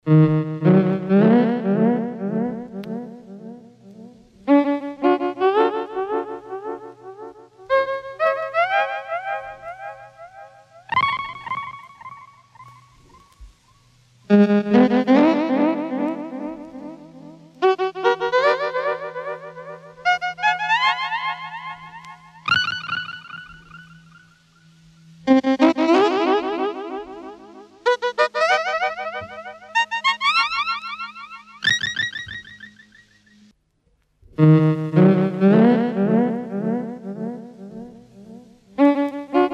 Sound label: melodic group, Electronic Sound Patterns; His Master's Voice